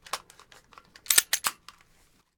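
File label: weapon marksman carbine jam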